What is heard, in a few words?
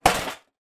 small metal case tin impact; foley; zapsplat